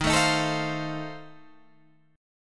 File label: F 7th D sharp